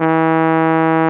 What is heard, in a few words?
Tuba D1